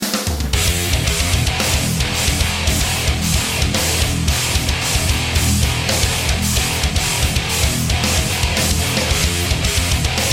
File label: Rock Ringtone